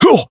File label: Voice damaged